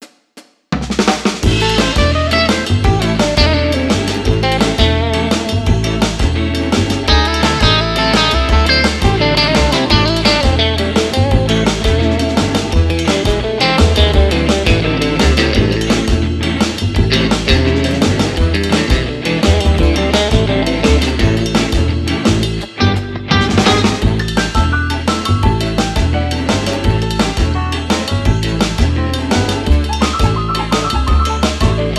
cheerful entertainment dual solo; by burak sound bank; cheerful vol 8

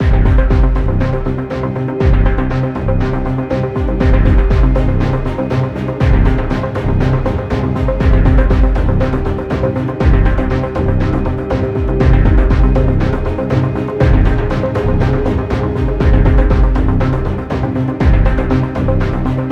soft tension; by burak sound bank; tension gerilim vol 47

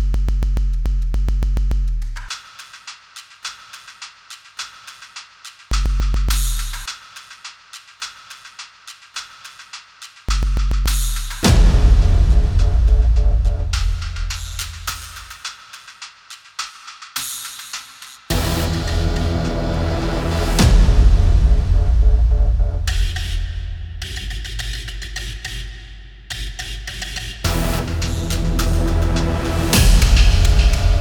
tensionproject intro; By Burak Sound Bank; tension gerilim vol 19

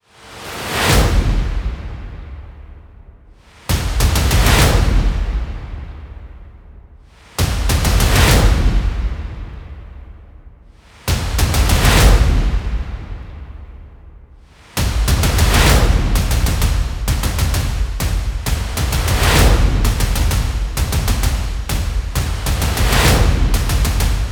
tension pad, horror excitement thriller song; By Burak Sound Bank

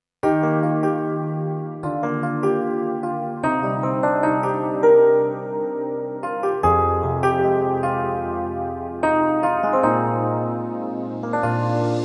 power ballad; By Burak Sound Bank; dramatic

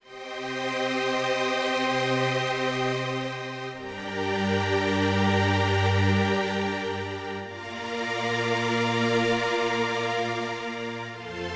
dramatic strings comp; By Burak Sound Bank

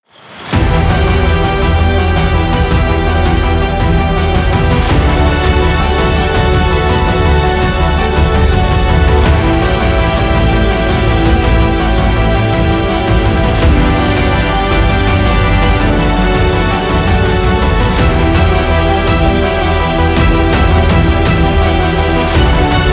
tension violin; By Burak Sound Bank; horror excitement thriller song

